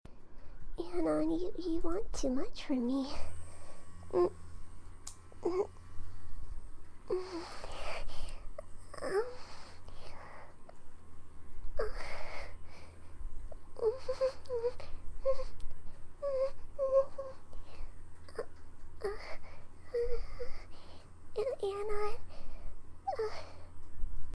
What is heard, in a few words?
moaning; Fluttershy